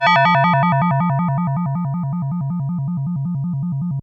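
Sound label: FM Bell FX DX100 D#5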